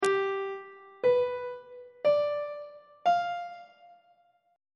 g dominant 7th chord intervals; on treble clef

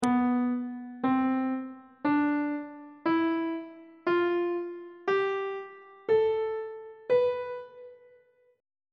c flat locrian mode note positions on treble clef

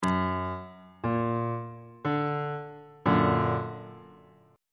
g flat augmented chord

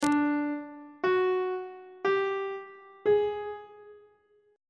c double sharp 4th intervals on treble clef

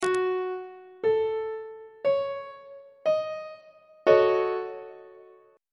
e flat half diminished 7th 1st inversion; on treble clef

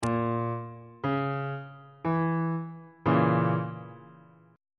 b flat diminished chord on bass clef

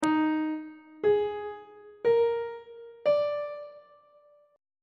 e flat major 7th suspended 4th chord intervals; on treble clef

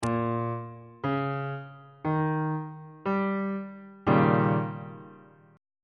e flat dominant 7th 2nd inversion

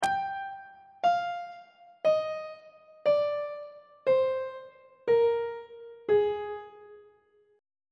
g sharp lydian mode descending on treble clef

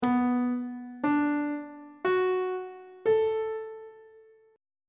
c flat aeolian 7th chord 1 is c flat minor 7th; on treble clef

